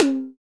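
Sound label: PER PROCU, Procussion, percussion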